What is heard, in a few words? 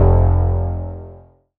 bass F1, Oberheim OB-Xa